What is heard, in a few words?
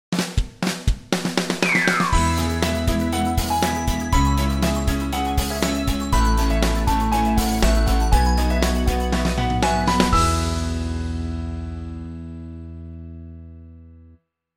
jingle